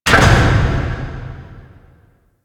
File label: Temple Tunnel Door Close A; Warframe; Harrow; Red Veil Temple